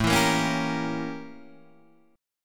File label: A 7th Flat 9th Guitar Open D